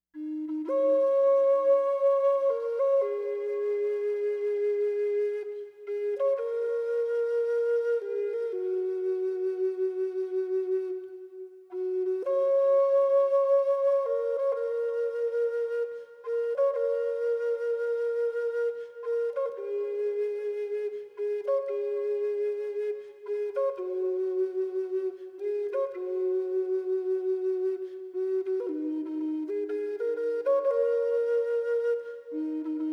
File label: Flute Song; Native Sounds; New Age